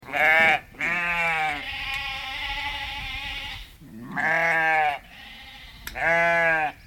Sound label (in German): schafe